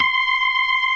TARK, ORGAN SPLIT; InVision Interactive; Keith Emerson

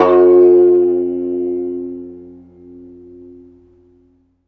SITAR F3; Spectrasonics; Heart of Asia

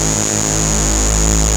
SYN RESON, SYN Analog; Sound & Vision; Gigapack I CD 2

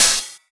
Open HH, Cymbals

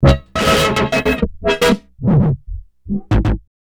DI CHORD 66 BP, RHYTHM LOOPS

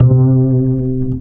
Single Acoustic Basses; Best Service; Dance Floor Bass

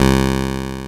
SQRE WAV LO, INST WAVES

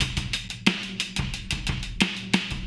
Process Beat, Hip-Hop Beats

